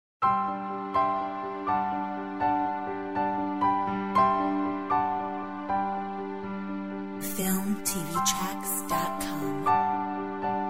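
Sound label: Orchestra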